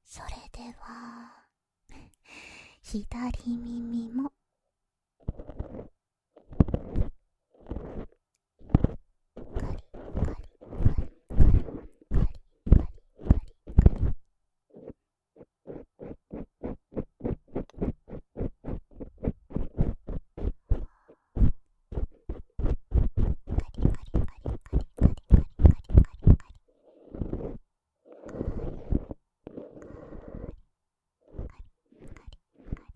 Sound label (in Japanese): 左プラスチックと竹耳かき, 梵天; ASMR; 言葉の不思議など